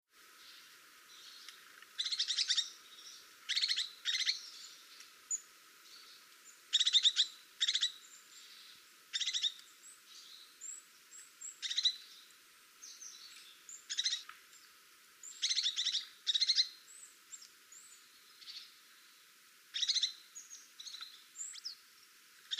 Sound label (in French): mesange huppee